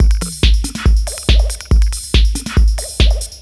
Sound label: electro beats 140